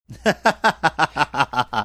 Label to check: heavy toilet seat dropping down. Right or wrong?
Wrong